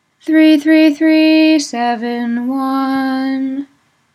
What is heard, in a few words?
weekday minor closing, nusach; SCALE AND MOTIFS FOR WEEKDAY MINOR